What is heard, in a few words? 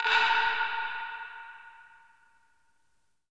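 Japanese PERCussion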